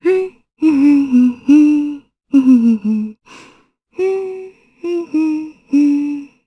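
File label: Vox Hum, Japanese; voices; heroes; Scarlet; kingsraid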